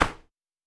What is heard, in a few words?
Snow